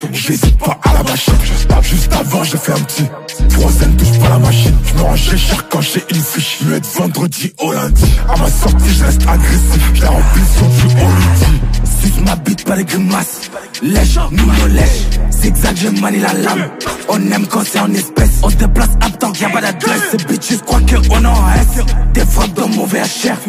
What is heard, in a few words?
drill fr